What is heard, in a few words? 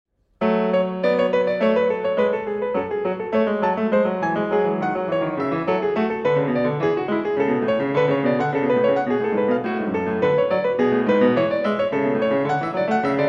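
Prelude & Fugue in F-sharp minor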